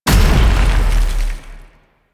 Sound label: Biped Stomp; Warframe; Man In The Wall